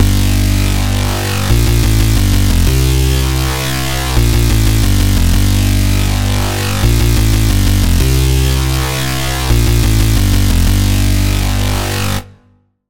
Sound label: Bass - Buzz; Buzz Fight; Routed Sound; Vital Expansion - Hyper Gamer Vol.1